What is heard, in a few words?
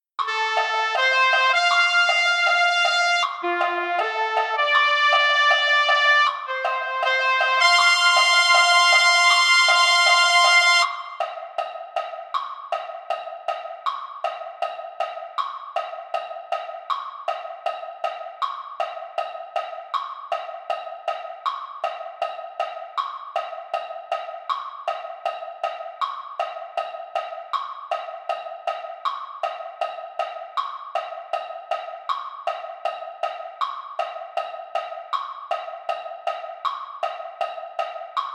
Trumpet; concert corps